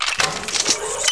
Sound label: railgun reloading